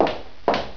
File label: WALK